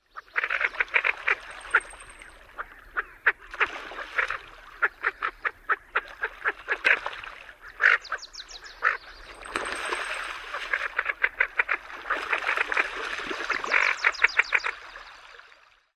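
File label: Greater Scaup, harsh female calls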